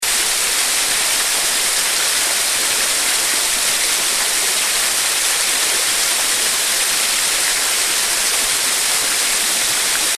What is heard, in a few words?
Ayers Gap Lower, waterfalls